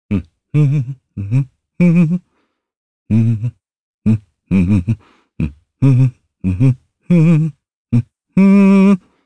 Hum, jp; voices; heroes; Kain; kings raid

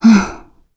Sigh, kr, voices; heroes; Valance; kings raid

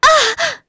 Vox Damage; kingsraid; voices heroes

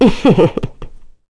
Vox Laugh; kingsraid; voices heroes; en

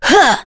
Vox Attack; Tanya; kingsraid

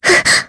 Vox Attack, jp; voices; heroes; Jane; kingsraid

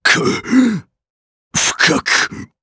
Vox Dead, jp; Bernheim; kingsraid

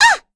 Vox Damage; Pansirone; kingsraid

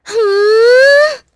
Casting, Vox; en; kingsraid

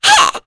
Vox Attack; Mirianne; kings raid; en

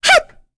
Vox Attack; en; voices; heroes; Rephy; kings raid

